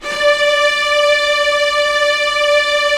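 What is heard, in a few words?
string section; Roland - LA Composer vol. 1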